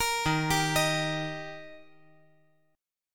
Eb Major Eb down 5G,4C,5E,5A; arpeggiated; JGuitar

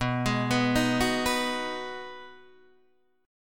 B 6th B down Guitar Open D

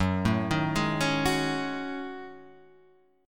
F sharp Minor 6th Add 9th, guitar; arpeggiated; 2,0,1,1,2,2

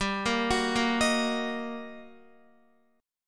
G Minor Major 7th Sharp 5th, down, Guitar; GmM7#5 arpeggiated; JGuitar

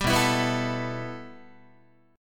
B 7th Suspended 4th Sharp 5th B down Guitar; B7sus4#5; strummed; JGuitar

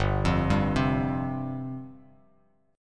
G 7th Suspended 4th G down Bass Standard; G7sus4 arpeggiated

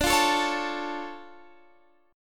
D Minor Major 7th D down Guitar Standard; DmM7 strummed; capo=3

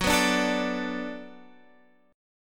B Minor 7th Gb, chord

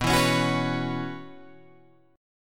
B Suspended 2nd Suspended 4th, Guitar, Open G

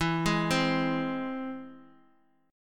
E Augmented

